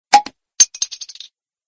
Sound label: bottle opener; Samsung; dzwonek na telefon; telefon komórkowy